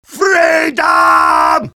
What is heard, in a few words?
battle cry; demoman